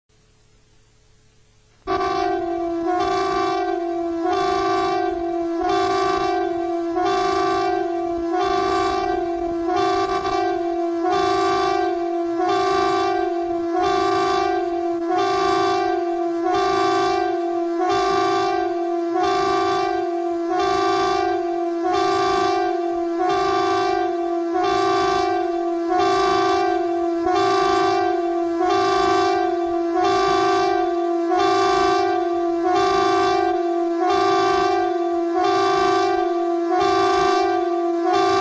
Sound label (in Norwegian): flyalarm, varsling av befolkningen; sivilforsvaret